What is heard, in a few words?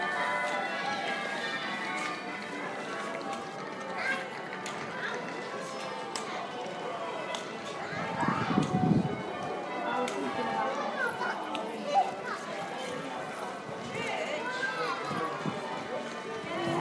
church bells and market ambience in chesterfield